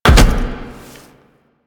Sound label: Land Heavy Glass, Footsteps; Warframe; Tenno